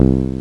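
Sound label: Electric Bass (finger) (C2); X-Wing; X-Wing Patches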